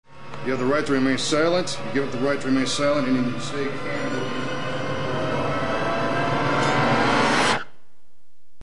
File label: gliss